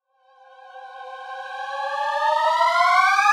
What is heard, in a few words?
choir fx